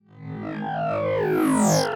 Machine Charge Down; SoundsCrate

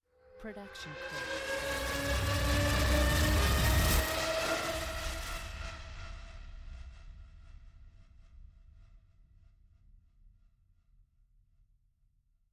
Buzz Riser Long

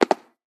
jump asphalt; soundscrate

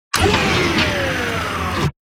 Robotic Movement; SoundsCrate

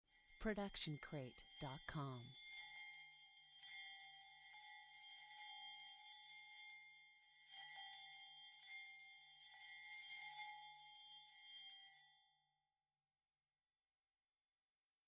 Magical Portal Shimmering; SoundsCrate

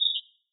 Bird Chirp; SoundsCrate

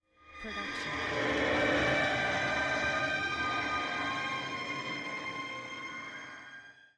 Screamy Screetchy; soundscrate

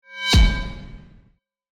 Epic Metal Hit; soundscrate

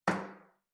Metal Footfall; soundscrate

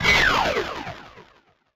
Anime Super Laser

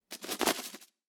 Bird Wings Fly Away; SoundsCrate

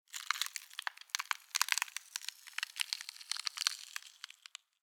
cracking

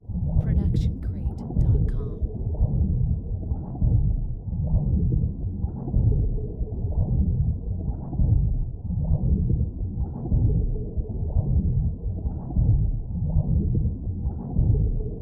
underwater swimming; soundscrate

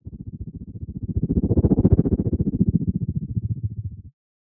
helicopter pass by; soundscrate

